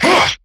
Melee Attack; New War; Erra

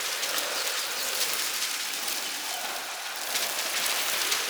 Floating Pages Loop; Ultimate Abilities; Zenurik; Warframe; Focus

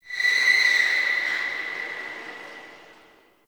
Distant Car Squeal; Warframe; Ambience; Nineteen Ninety Nine

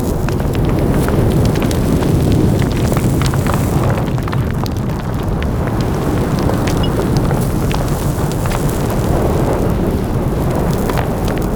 Town Crater Smoulder Loop; Ambience; Gameplay; Duviri

